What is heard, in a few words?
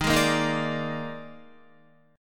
Eb Major 7th Suspended 4th, Guitar; strummed; chord; JGuitar